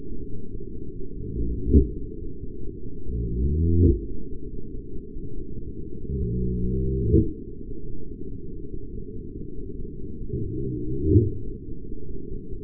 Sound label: grunt gray seal